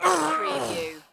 Human Death, Male Dying Sigh, Grunt

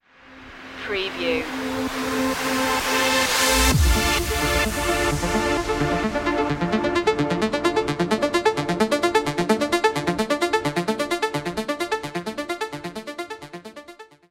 beat the grind stinger

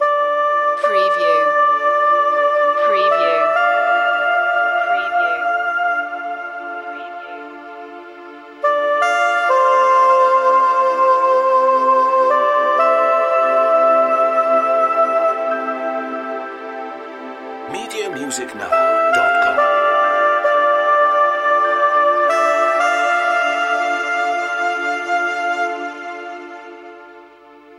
soprano sax air loop

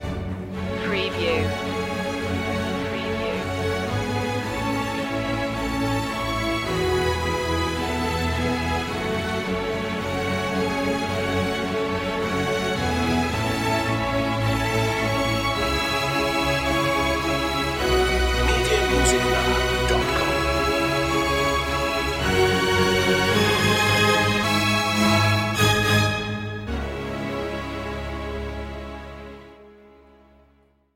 the conquest strings only

